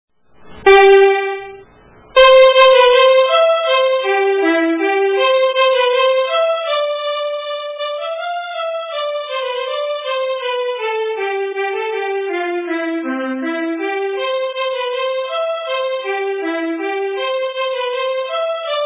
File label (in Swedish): marschen